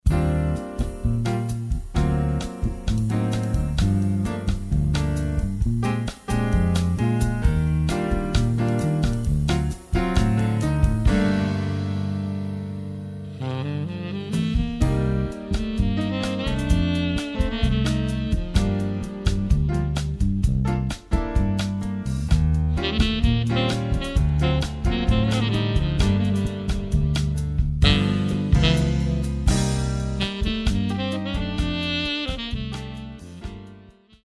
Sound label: Bossa